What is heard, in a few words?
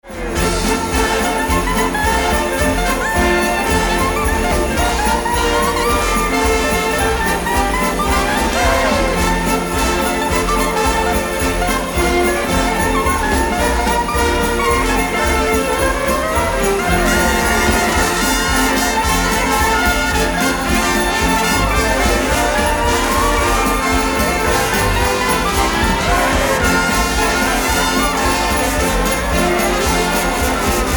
Jingle Bells